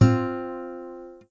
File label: interval harmonic